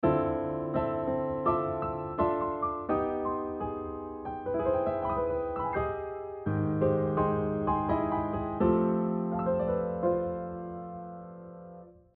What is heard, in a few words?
equal division of octave